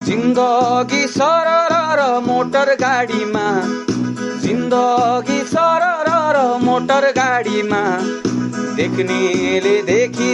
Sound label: bengali song